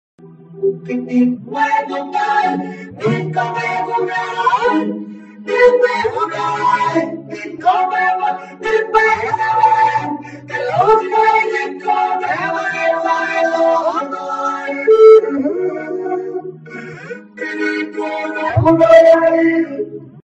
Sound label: autotune